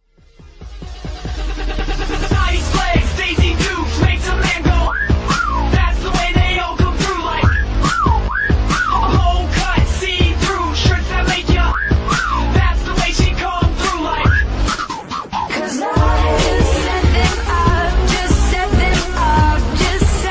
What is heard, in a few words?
iphone 3g s rock ringtone